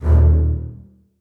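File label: basses staccato d#2; Sonatina Symphonic Orchestra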